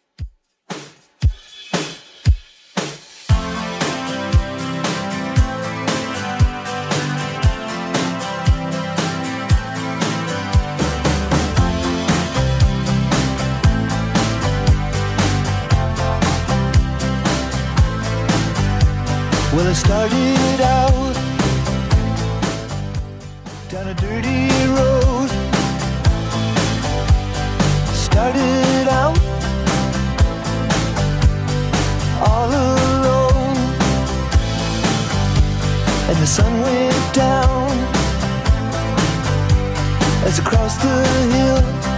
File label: 90s 116bpm